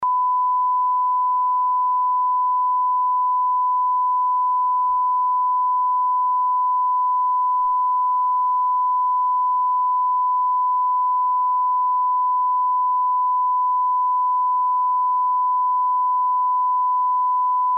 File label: 1khz cal tone 94dbspl